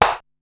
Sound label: Tenny Hit High, Korg Wavestation-SR, Drum Kit; Wave 455 - Drum Kit